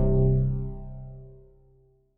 Shield Hit; Shield Hit Animation; Super-Robo-Squad

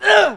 Male Grunt; Player; GMTK Game Jam 2020

